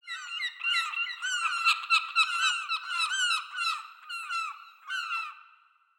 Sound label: ambient sea birds